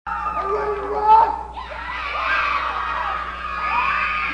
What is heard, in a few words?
Rock